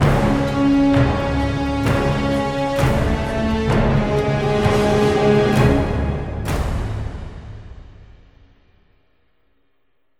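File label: sting death; Transformers Dark of the Moon The Game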